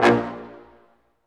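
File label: BRS Large Ensemble stac; Roland L-CD702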